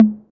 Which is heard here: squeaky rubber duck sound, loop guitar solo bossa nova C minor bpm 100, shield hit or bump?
bump